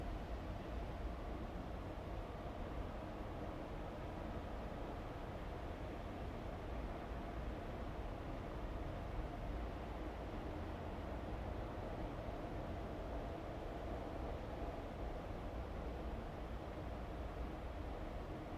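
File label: distant endless calm sound of factory work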